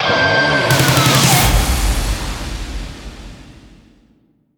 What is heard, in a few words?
big win start; netent